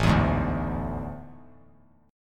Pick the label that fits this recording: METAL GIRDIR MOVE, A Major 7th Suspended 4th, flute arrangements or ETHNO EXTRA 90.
A Major 7th Suspended 4th